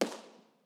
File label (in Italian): IR palloncino, Teatro; Teatro Benevento